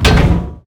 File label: damage hits explosions, Warships; World Of Warships